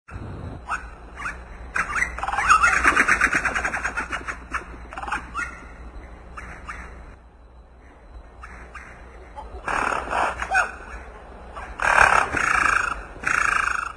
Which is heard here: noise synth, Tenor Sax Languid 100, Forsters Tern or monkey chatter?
monkey chatter